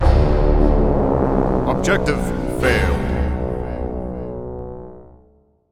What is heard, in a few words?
Fail; Mason; Age of Chivalry; Windows